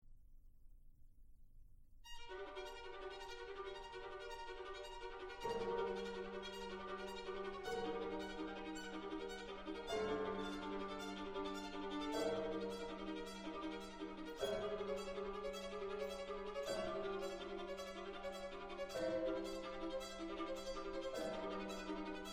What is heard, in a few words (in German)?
Violinkonzert; Alfred Schnittke